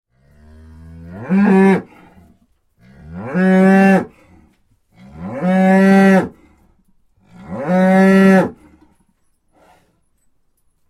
cow ringtone

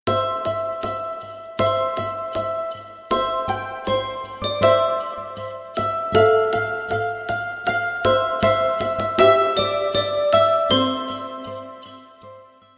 jingle bells ringtone

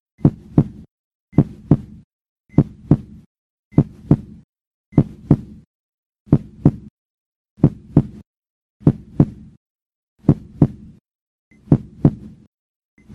heartbeat

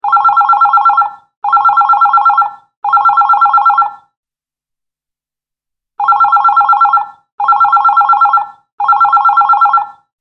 digital telephone ringtone

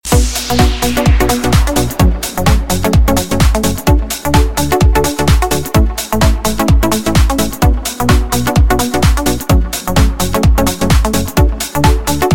electronic love ringtone

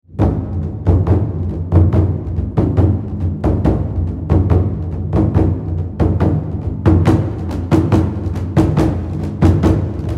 drums ringtone; isle-of-dogs

